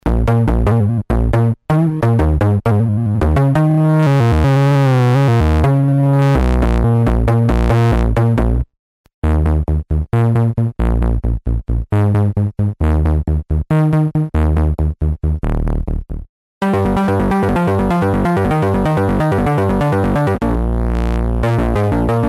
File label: synbass; droid3